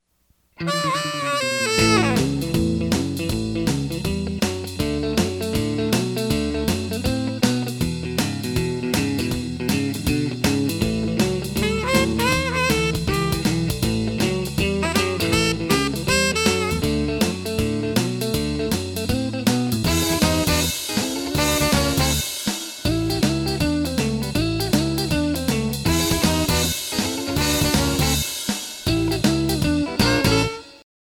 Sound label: country